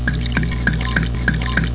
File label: drinking